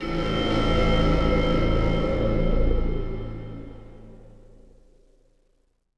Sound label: Combo Sting